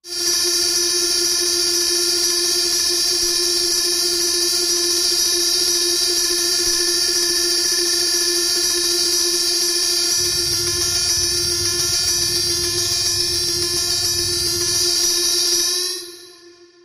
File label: Brain Agitator Machin, alarms